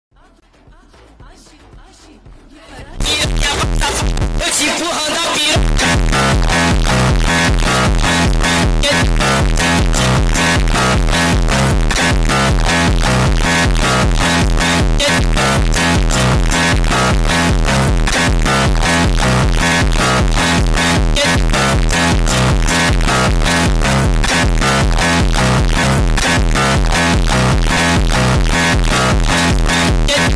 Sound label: Brazilskij fonk